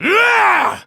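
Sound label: yell, male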